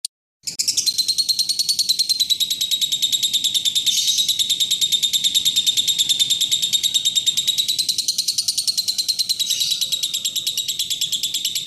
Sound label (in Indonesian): lovebird ngekek panjang; golden boy